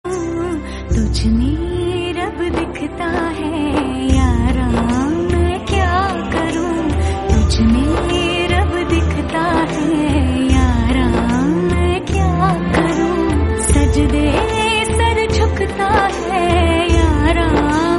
female, ringtone

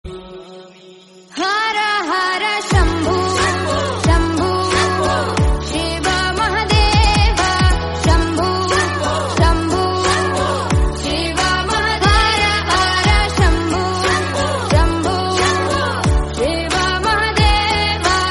psytrance remix, ringtone; hara hara mahadev

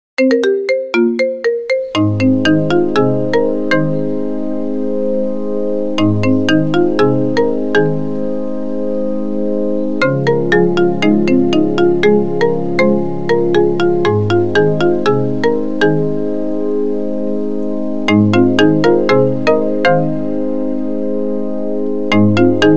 marimba; Chill Guy

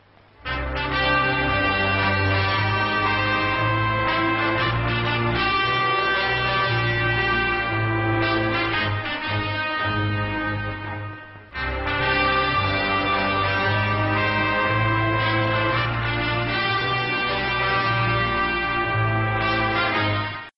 mass bgm

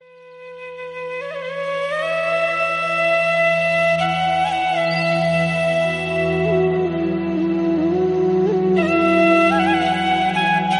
devotional flute

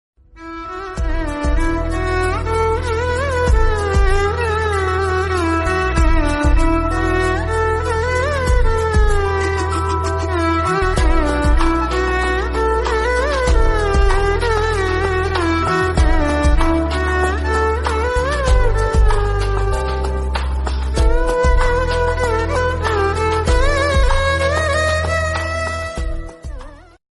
violin

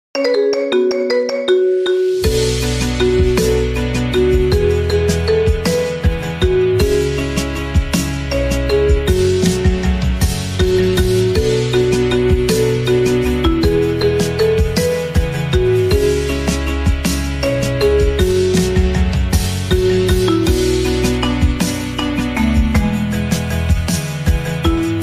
marimba

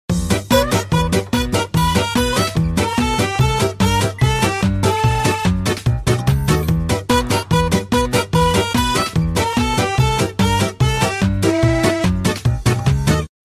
Samsung Circus, ringtone

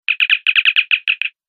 star trek communicator

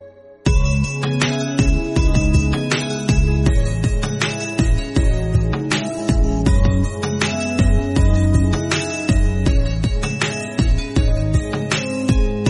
smooth, ringtone

